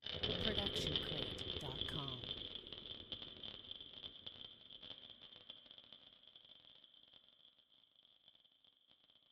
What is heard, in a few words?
horror scrape; SoundsCrate